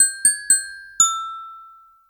Diving Warbler

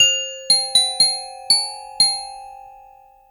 Floating Finch

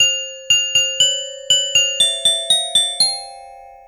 Soaring Waxwing

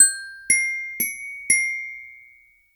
Diving Crane

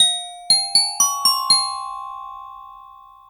Gliding Sparrow

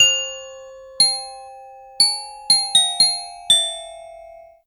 Soaring Sparrow

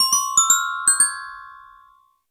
Floating Buzzard

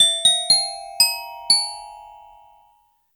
Hovering Waxwing

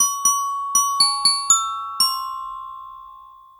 Floating Albatross

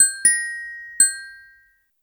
Hovering Buzzard

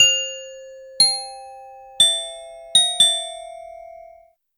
Soaring Owl

